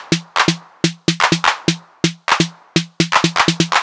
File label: drum ohnine percussion 125, Classic Chicago House Drum Loops